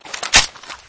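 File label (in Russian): перезарядка винтовки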